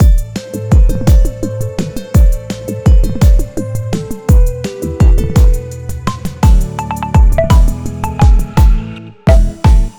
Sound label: Jingle; Oplom Official